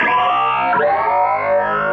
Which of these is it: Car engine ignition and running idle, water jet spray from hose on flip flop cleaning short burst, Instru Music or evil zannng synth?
evil zannng synth